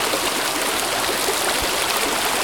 ambience water small waterfall; smite; conquest

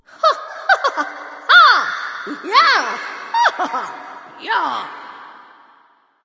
yay light; smite; voicepack; hel

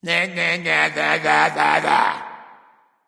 tongue; smite; ahpuch

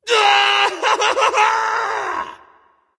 battlecry; smite; hades

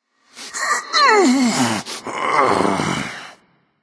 grunt lift

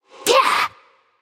grunt attack; smite; sol

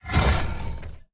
armor heavy; smite; god atlas

